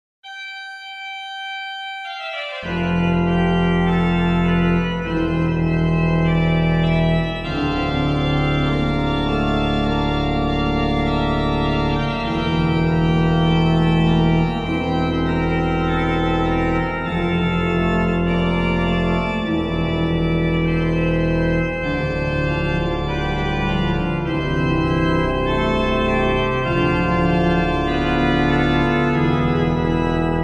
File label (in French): Gloria Plein Jeu Qui tollis, OrganMusic; Beauvarlet Charpentier Journal; Souvigny